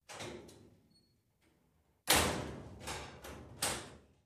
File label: Locker Slam Mediu